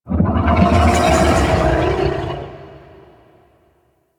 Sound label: Move; Golden Maw; Warframe; Ambience; The War Within